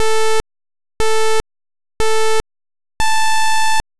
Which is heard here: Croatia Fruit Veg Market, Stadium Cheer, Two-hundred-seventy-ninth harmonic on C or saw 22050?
saw 22050